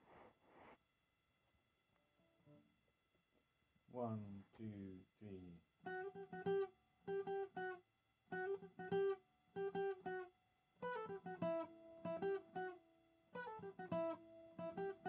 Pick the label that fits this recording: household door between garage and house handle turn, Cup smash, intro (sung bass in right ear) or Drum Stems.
intro (sung bass in right ear)